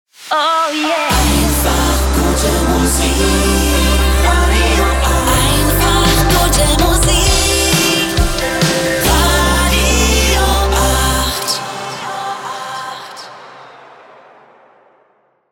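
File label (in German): Transition soft AC to med aktuell; RADIO 8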